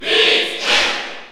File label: Cheer French; Pit; SSBU